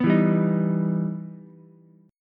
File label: E Minor Major 7th, Ukulele; EmM7 strummed; 4,4,3,6